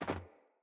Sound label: Sandals Land Metal, Footsteps; Player